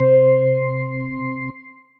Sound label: Organ, Tonewheel Organ; Electric Keyboards; Organ Bach 2 Basics; Ableton; Factory Packs